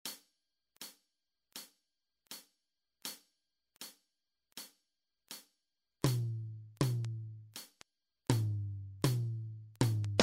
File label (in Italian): Esercizio ritmico